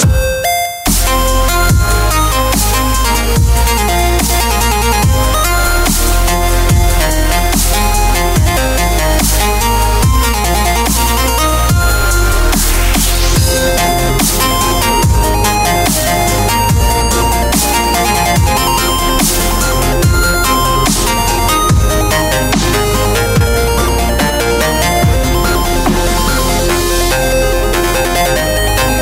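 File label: 8bit DUBSTEP; Keygen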